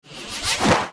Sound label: Football slide close; Nokia; Audio themes